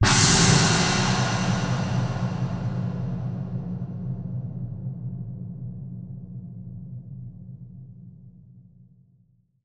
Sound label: Crash, impact samples; Crashes